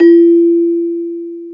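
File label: BEL CELES, celesta; Roland - LA Composer vol. 1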